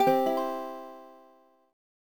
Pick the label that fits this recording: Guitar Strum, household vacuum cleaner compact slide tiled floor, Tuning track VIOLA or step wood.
Guitar Strum